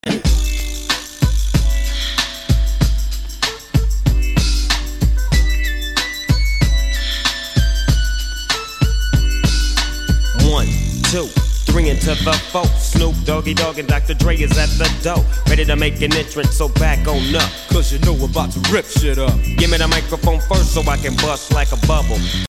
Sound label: vibra